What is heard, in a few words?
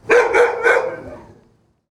dog barking